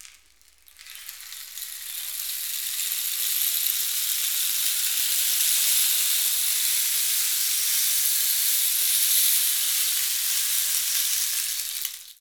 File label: RAIN STIC; Latin Drums & Rain